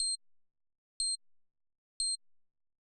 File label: roulette countdown